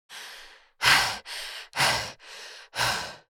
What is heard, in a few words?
Adult female excited breath; Breathing